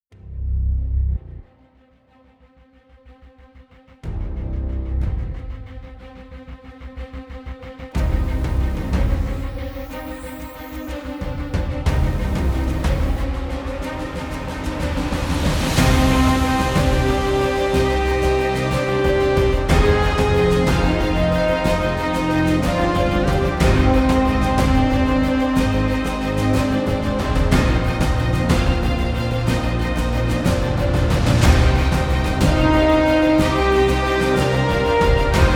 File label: Maintheme Modern Orchestra